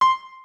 cursor; OneShot Fading Memory

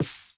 ball landing bunker

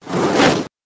menu smoke

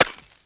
ice light collision

